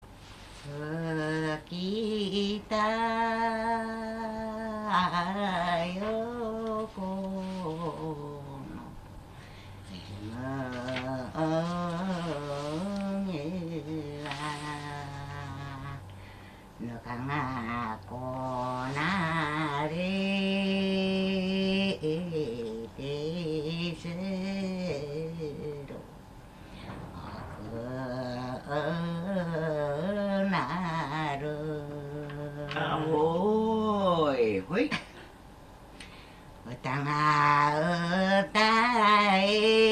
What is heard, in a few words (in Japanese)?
mugitukiuta, minyou; 麦つきうた; 麦搗き歌; 柏市; 布施